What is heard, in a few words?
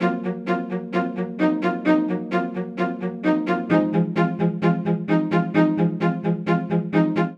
Phrase Suspense and Release F Minor 130 bpm, String Quartet; MIDI Clips; Ableton; Factory Packs; Spitfire Audio